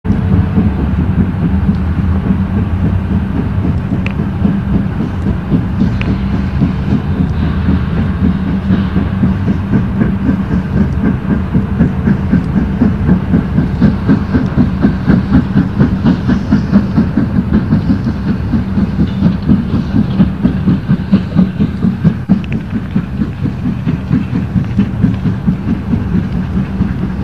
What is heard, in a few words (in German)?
Schleuderanfahrt; alsdorf